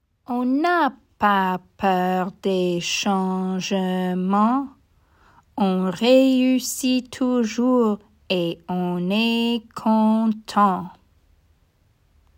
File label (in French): grenouilles